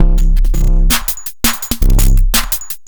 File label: Beat, Futurist Drum & Bass; Drums & Percussion